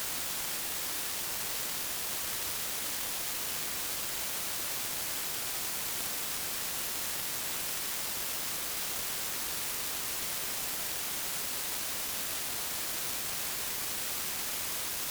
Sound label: darknoise